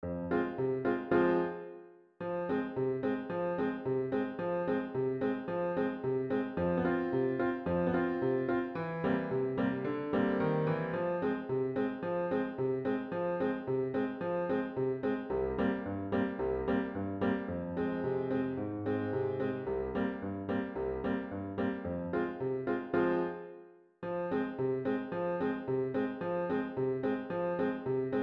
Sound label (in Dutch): zonder klarinet snel; Bulgar from Odessa